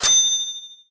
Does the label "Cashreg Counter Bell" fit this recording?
Yes